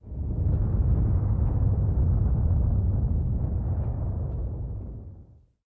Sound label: canyon, snow